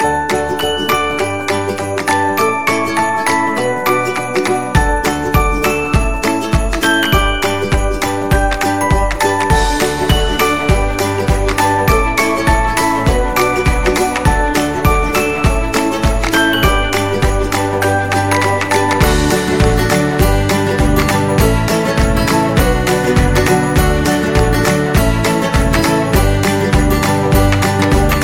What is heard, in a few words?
Upbeat Christmas Song